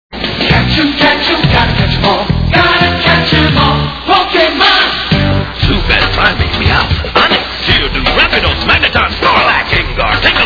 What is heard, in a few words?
rap